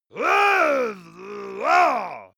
monster male growl aggressive; kreaturen

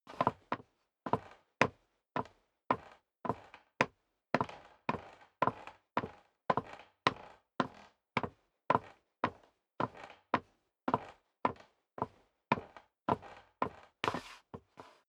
step sneaker wood creak upstairs sequence; schritte

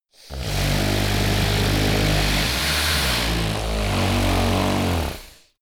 monster snore heavy low; comedy-cartoon; koerpergeraeusche

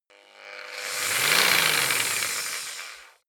jigsaw engine medium slow short; maschinen; saege

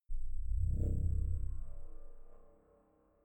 crushed bass growl soft; horror

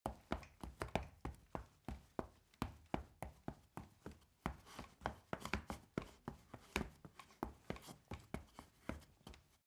step ladder wood fast; schritte

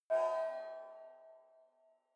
sci-fi facility ai awake damaged; maschinen; signale; soundpool

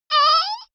female combat hit soft

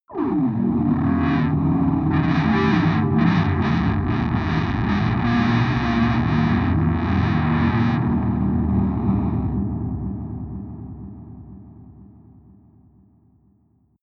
atmospheric feedback distortion hard, drones; musikelemente

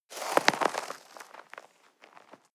step snow walk single

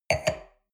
wine glass place table soft; berufe; gastronomie; soundpool

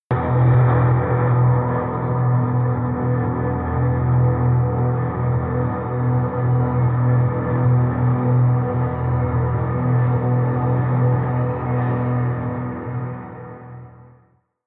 industrial soundscape; musikelemente; drones

